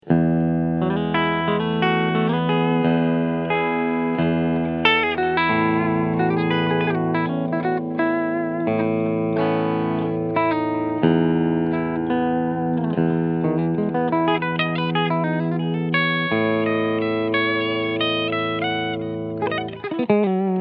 TE 53KR BL Tribute Serie Steg PU Cleansound; Harley Benton

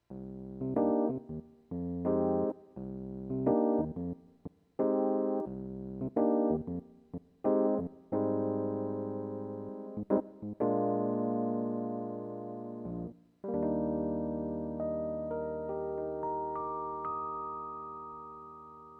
EPiano; Prodipe

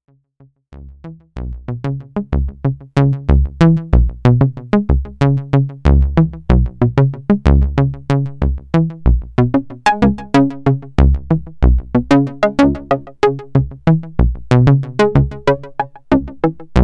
A110 Sync LFO mod

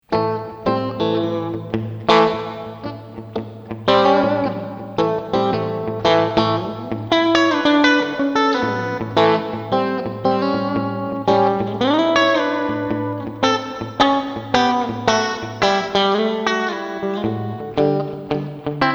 SansAmp Reverb Clean

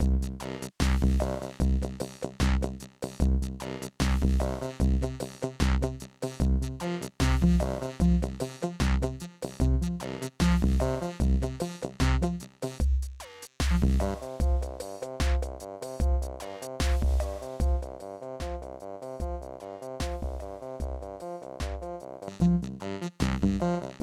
vocoder freeze uncut groove arp

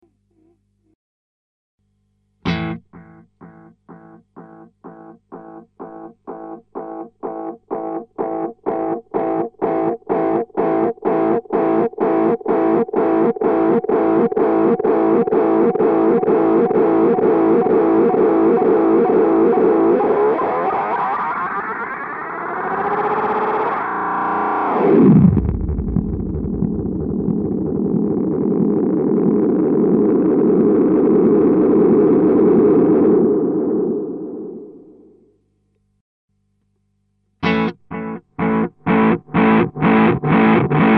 Seymour Duncan Vapor Trail Oszillation

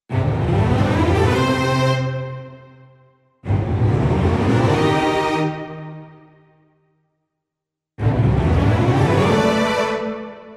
Chris Hein Ensemble Strings Full Mid 2 Octaves Up

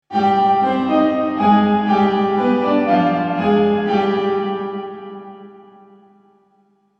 Piano Ambience Hardware

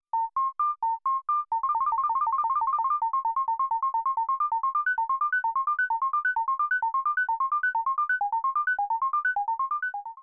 Filter V per Oct Self Resonance Play; ACL System 2

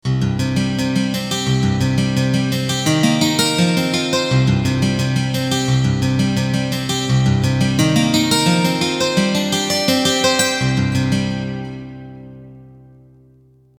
Guitar Strummer

